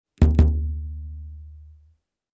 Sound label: Elastic rubber band plucking multiple